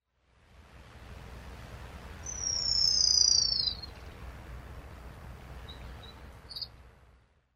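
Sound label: Pleske's Grasshopper Warbler; kashima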